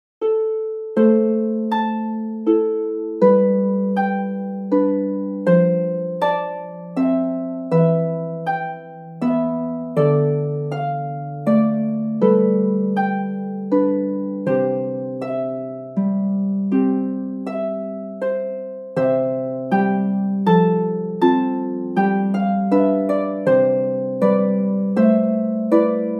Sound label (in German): Harfe und Melodie; Es kommt ein Schiff geladen